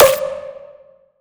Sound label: Snare C; Cymatics; Titan